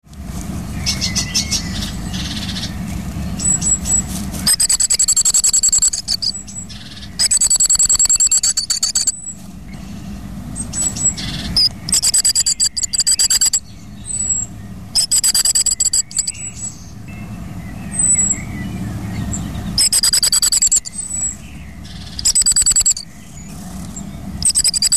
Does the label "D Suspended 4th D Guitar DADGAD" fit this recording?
No